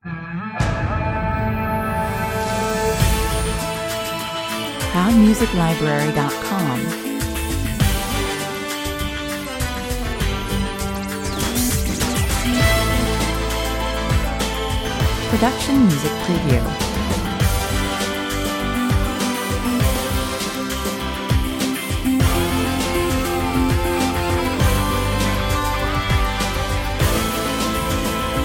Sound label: battle cry, 100 bpm